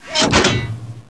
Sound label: reload; raydium